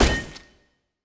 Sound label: Item Fall